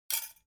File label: Dough hook drop; roi xuong